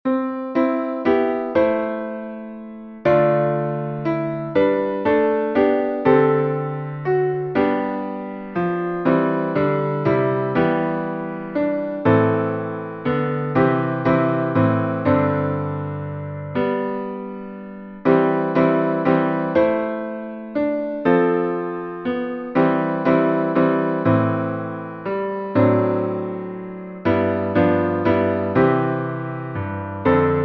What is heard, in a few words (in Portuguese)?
instrumental